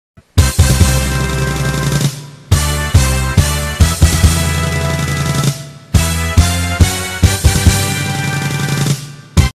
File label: magic sing 90 100